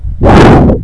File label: FOLDER; LOTUS